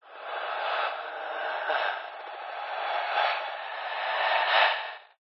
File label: horror ghost evp eerie breathing fearful processed; zapsplat